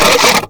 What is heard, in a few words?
Flying Robot Engine Start; Warframe; Veilbreaker; Ambience; Kahl Quest